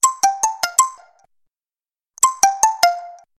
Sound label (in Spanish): timbales ti ti ti ti ti